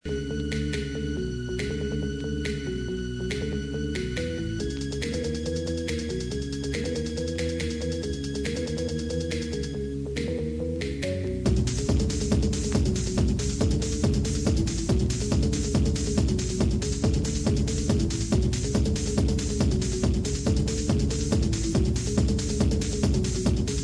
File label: organ